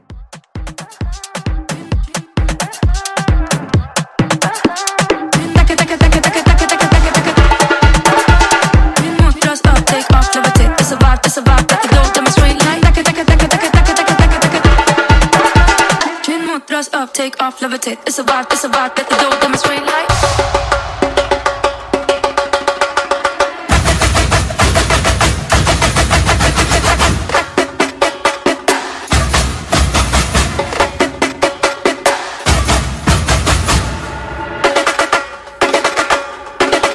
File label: Drums Tribal Rework